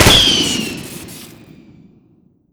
flare detonator launch crit; tf2classic

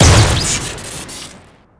burstgun shoot crit; weapons; tf2classic

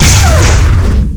tau explosion charge; tf2classic

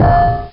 grenade impact; tf2classic

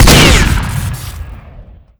big iron shoot crit; tf2classic

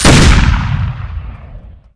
deagle shoot; tf2classic

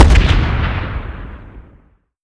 burster explode; tf2classic

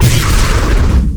explosion; cow mangler; tf2classic

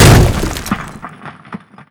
brick hit; tf2classic

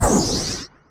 discipline device power down; tf2classic

